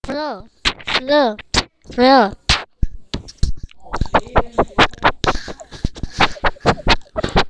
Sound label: flute